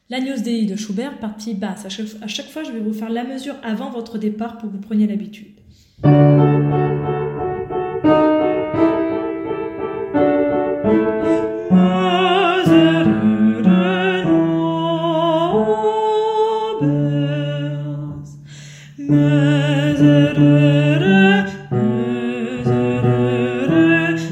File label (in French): partie basse; Agnus dei